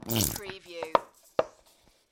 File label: Squeezy Honey Bottle; beanstalk audio